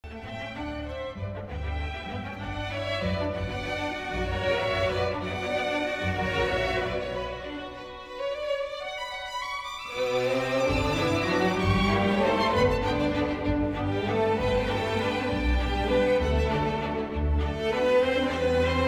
valse moderato

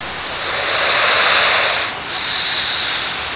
alligator Low Threat Hiss; journeynorth

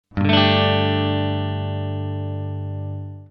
gsus2 open; fretjam

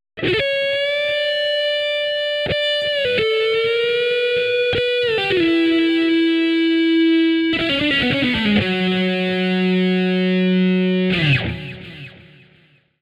no vibrato; example; fretjam

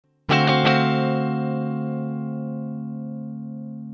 sharp 9th; fretjam

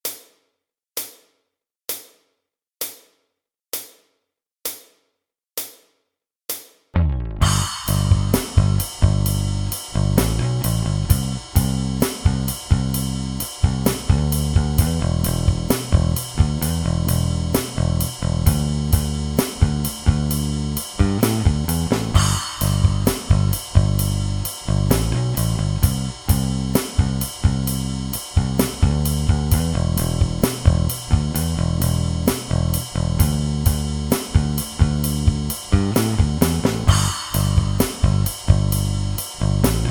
chord pick

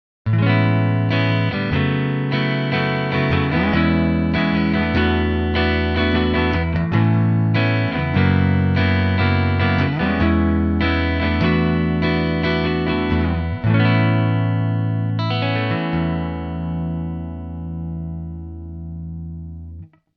float; fretjam

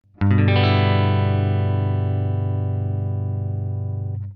gadd6 open; fretjam

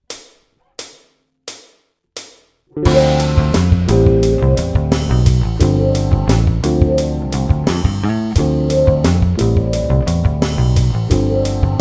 d dorian bk; fretjam

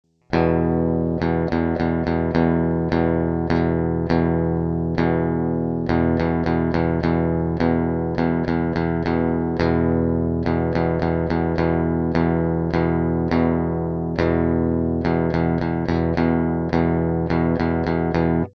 metal strum; fretjam

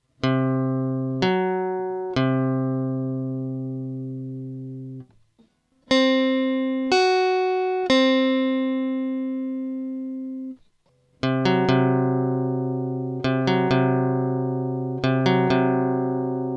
augmented 4th interval; fretjam

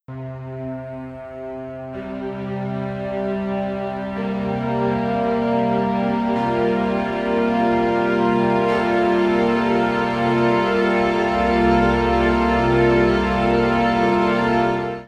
c13sus4; fretjam

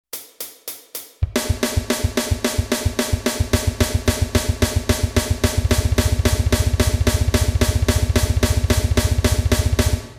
blast; fretjam

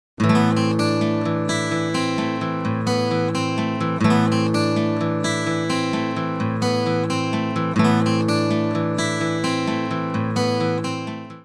chord phrase; fretjam

